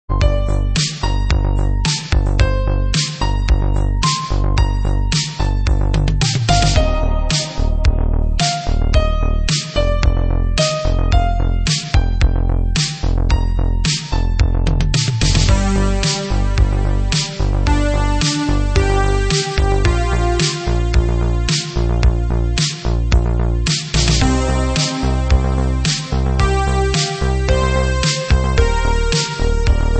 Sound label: synthwave remix; Human Race level 4